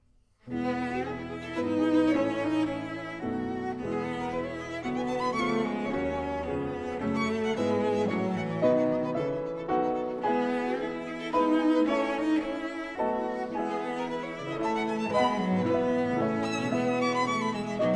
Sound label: Piano Trio in A minor; Tchaikovsky; ＂In Memory of a Great Artist＂; Op． 50